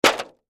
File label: Impact Plastic Sheet Hit Large; Impact Plastic Sheet Hit Dull Muted Large; Blastwave